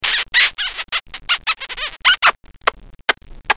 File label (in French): Bisou